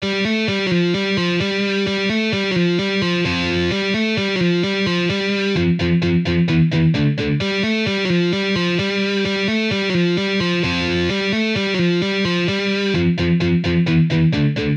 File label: Metal guitar riffs